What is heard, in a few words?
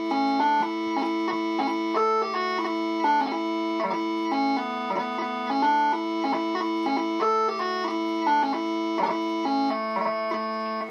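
Bonnie Dundee, bagpipe tunes; new years & robbie burns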